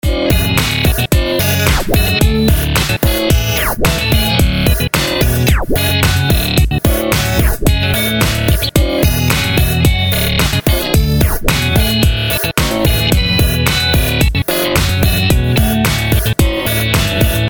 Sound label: Glitch tar; Liquidize